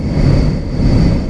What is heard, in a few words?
daed idle; rogue; daedalus